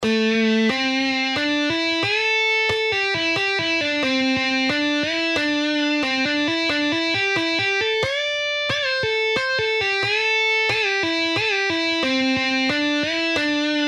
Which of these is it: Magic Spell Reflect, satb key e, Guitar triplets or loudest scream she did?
Guitar triplets